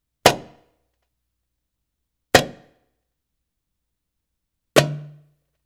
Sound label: Arabic Rhythms